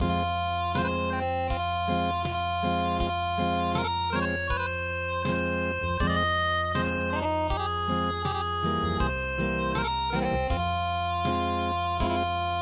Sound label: lullaby Acoustic; traditional